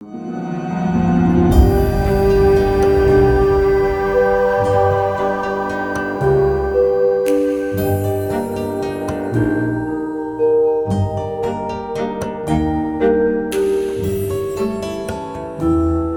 Station Reveal Cue; Stations; Verminia

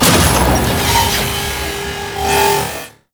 Gas City Reactor Deactivate; Warframe; Corpus Gas City; Gameplay Remaster; Ambience

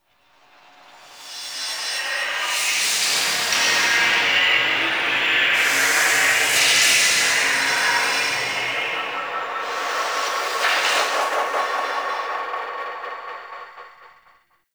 Ghost Cargo Echoes, Ambience; Warframe; The New War; Zariman

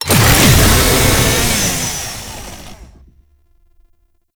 Chain Saw Tonfa Stack Buff; Warframe; Grineer

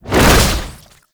Underground Attack Swing; Enemies; Abilities; Warframe; Proto Infested Babau

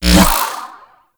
Kick Punch Whoosh; Warframe; Weapons; Corpus

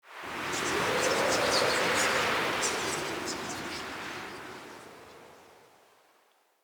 Day Wind Gust Calm

